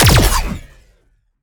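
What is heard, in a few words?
Sci weapon Shot Medium Laser Proton Popper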